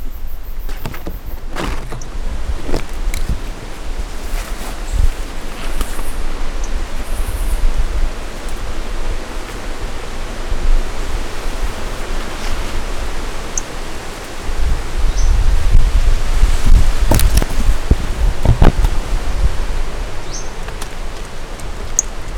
Brachycephalus mirissimus 30-100 cm four initial notes